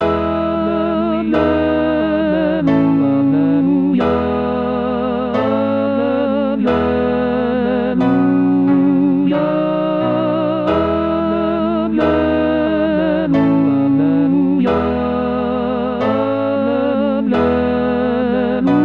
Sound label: alleluia tenor